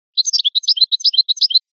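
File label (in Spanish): floreo chichiba chichiba, canario; campero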